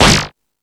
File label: Found Sound, E-MU Pro-Cussion